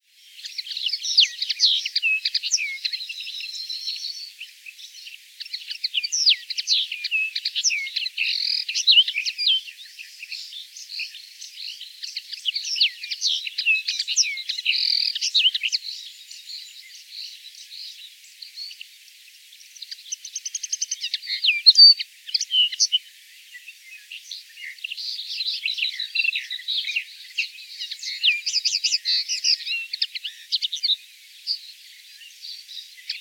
linotte melodieuse chant

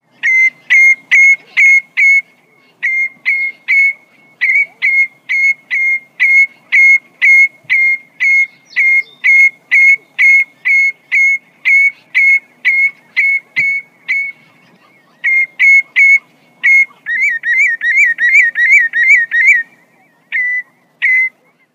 chevalier gambette chant

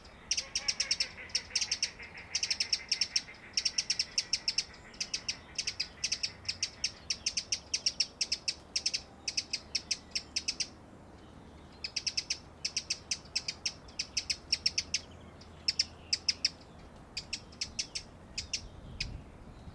troglodyte appel

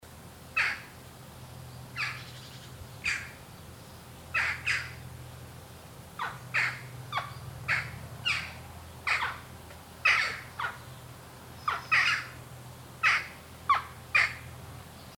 choucas appel

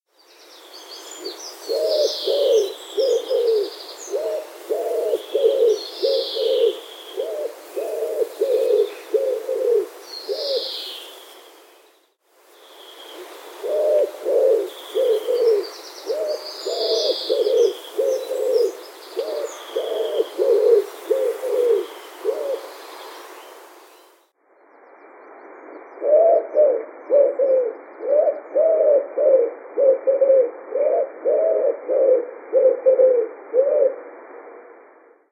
pigeon ramier chant

